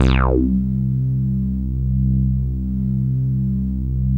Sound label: FILTR BASS